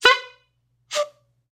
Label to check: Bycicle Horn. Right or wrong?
Right